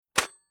Tool Box Putting Down Top Tool Tray Box Metal Impact Ring Off